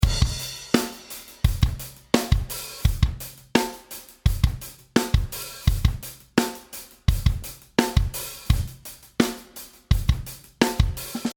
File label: Drumbeat American Comp Punch